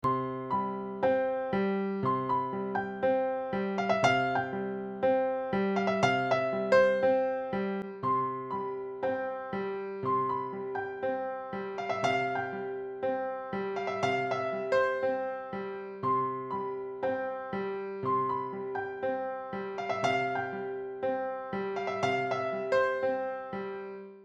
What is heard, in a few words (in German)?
Hall Plate